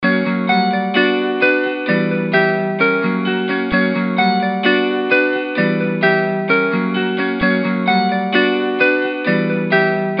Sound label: Psycho 130BPM